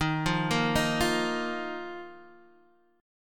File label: D sharp Suspended 2nd, Guitar, Open D